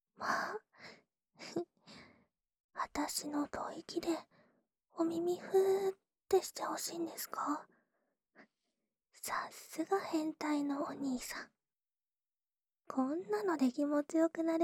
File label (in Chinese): 安眠用呼呼吹耳（左耳）; 掏耳按摩店「春乃抚子」